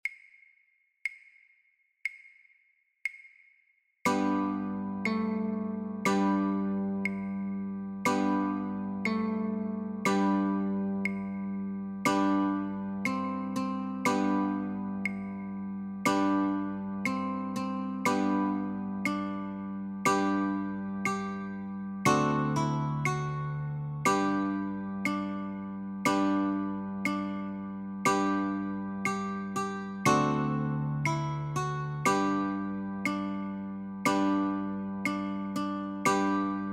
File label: Notes and Chords, Guitar